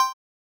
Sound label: Game retro menu move